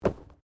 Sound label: Body movement Organic Impact Light